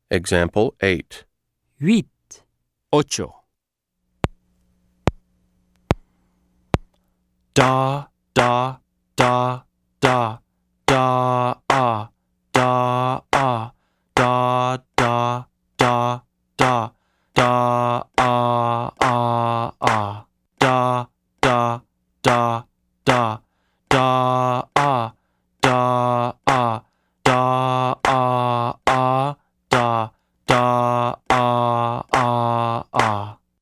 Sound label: Keyboard